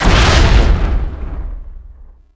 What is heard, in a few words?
explo droid; impacts explos